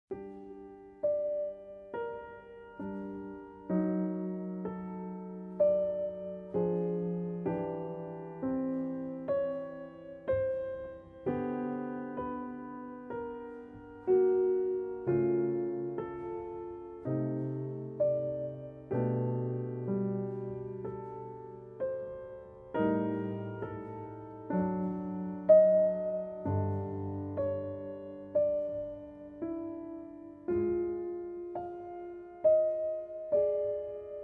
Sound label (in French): Musique de Relaxation